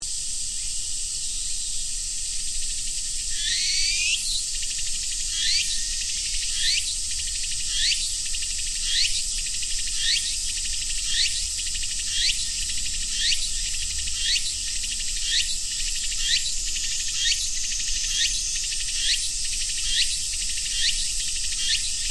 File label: cicadas